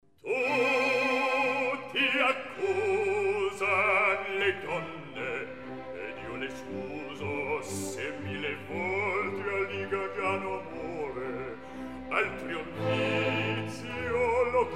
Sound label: Andante; Tutti accusan le donne; Mozart, Wolfang Amadeus; Cosi fan tutte